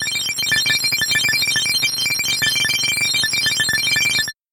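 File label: electronic sounds of data transmission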